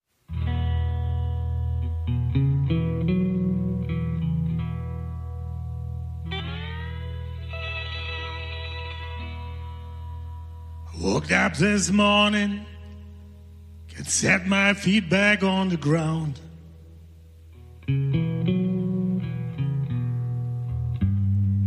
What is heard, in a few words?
Blues